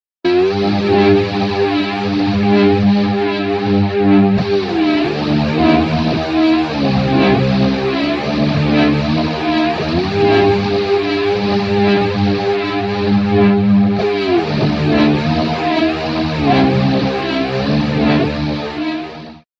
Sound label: through zero chorus flange